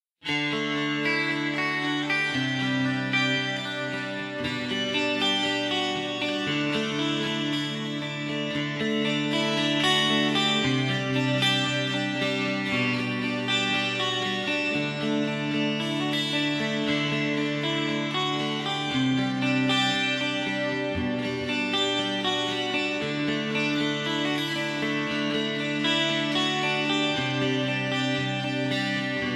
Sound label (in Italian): Clean Sound, chitarra; Omnyss